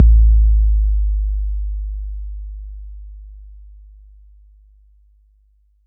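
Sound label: bass drum 808 Decay F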